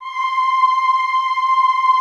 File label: EHH C5, Classical Choir; Best Service